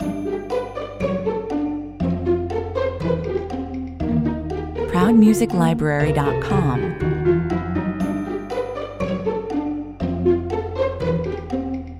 full mix

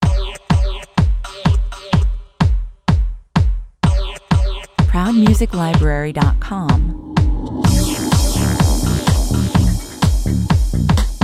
sand surfer (full mix) 126 bpm

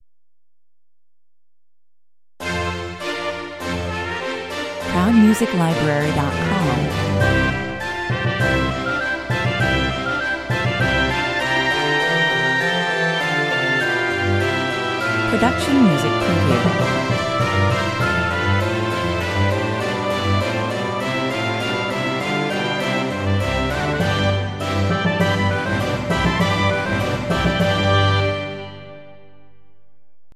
baroque 107 bpm

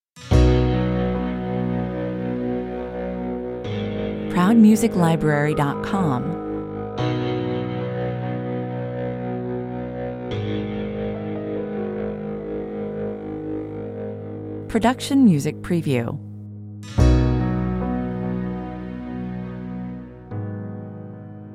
wide open space 72 bpm

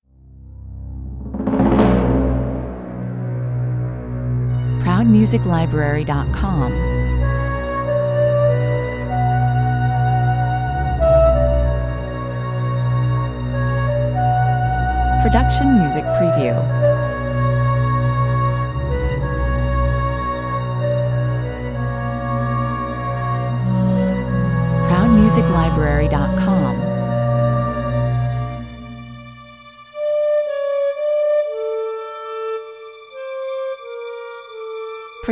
light orchestral 94 bpm

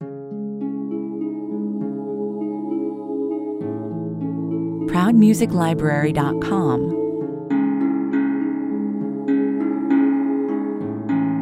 magical lullaby 100 bpm

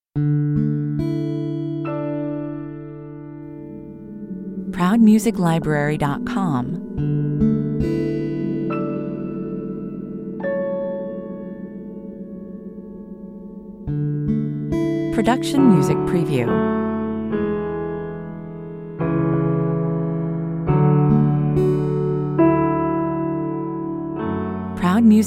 humming in the night 70 bpm

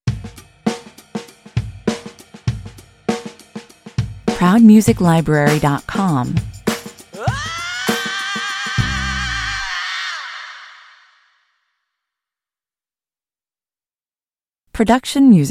funkin yell stinger

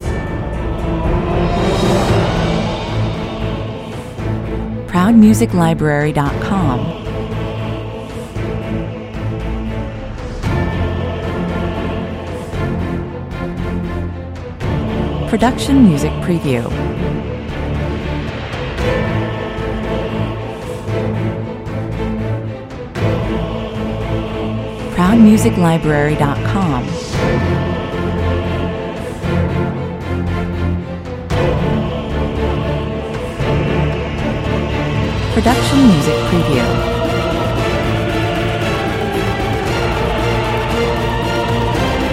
with choir low intensity

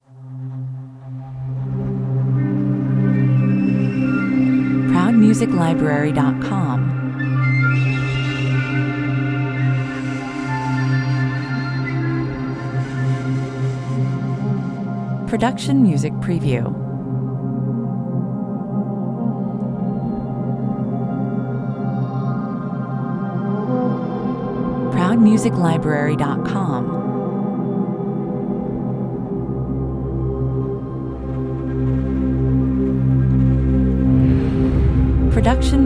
flute, opener, 128 bpm

